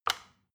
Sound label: plastic button tiny release heavy